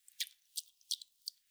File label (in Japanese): じぶき 長; ぴゅっ 短め